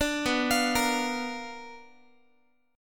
B Minor Major 7th Flat 5th Guitar; arpeggiated; JGuitar

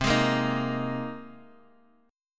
C Minor 7th Sharp 5th, Guitar, Open G

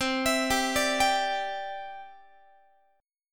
C-Major Add 9th, Guitar; arpeggiated; JGuitar

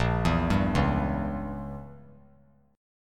A sharp Diminished 7th; arpeggiated; JGuitar

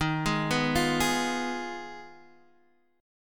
G sharp Minor 6th D sharp

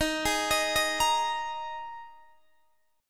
D sharp Suspended 4th D sharp, Guitar DADGAD; chord shape

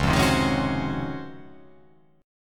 C sharp Major 11th, chord

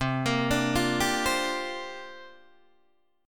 C 9th Suspended 4th C down Guitar Open D

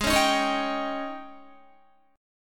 G sharp Major 7th Suspended 4th, Guitar; strummed; JGuitar

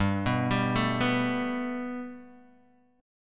G Major Add 9th, Guitar, Open D; down

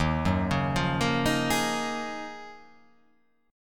Eb Minor 11th Eb down; Ebm11 arpeggiated; JGuitar

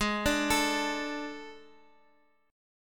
A Major 7th Suspended 4th Ab

